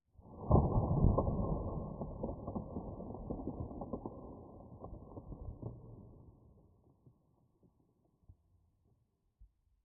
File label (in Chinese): 玄武岩三角洲爆裂声; ambient